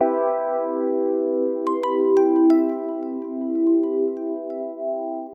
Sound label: Phasing